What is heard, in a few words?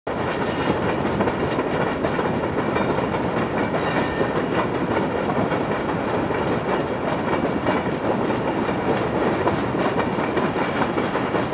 hanging rail